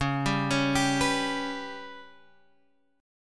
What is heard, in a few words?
B Suspended 2nd Sharp 5th